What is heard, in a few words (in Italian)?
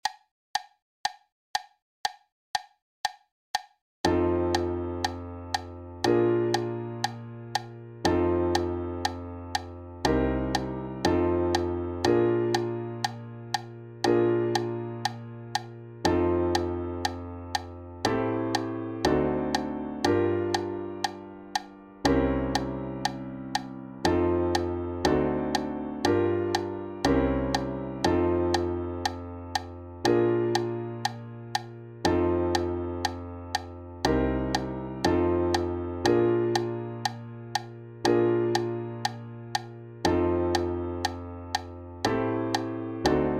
Progressione F Blues Jazz, walking bass